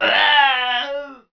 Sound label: death, nali